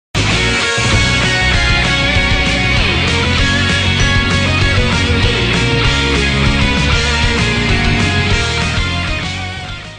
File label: Instrumental; Toa